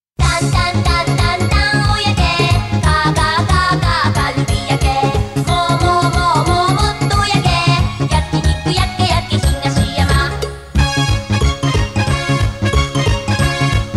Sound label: music